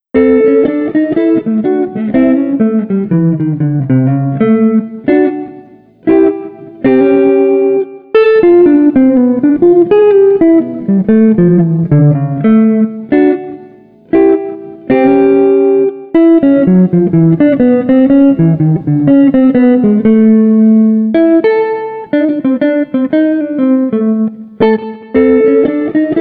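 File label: Street Guitar Jazz Chill, 120 bpm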